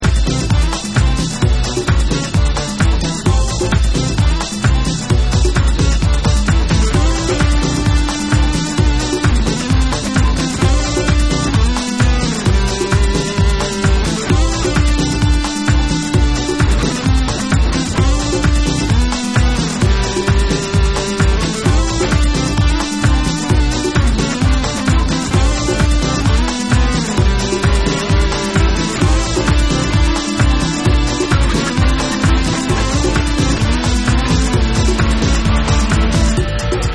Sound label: Instrumental Mix